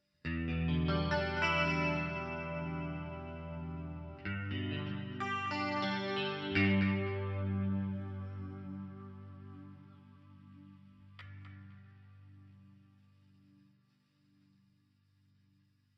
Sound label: clean